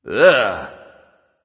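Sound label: non-verbal ugh; smite; voicepack; houyi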